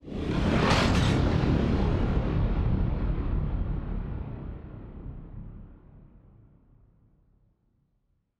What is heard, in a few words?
ship construction ship move; Stellaris; machineage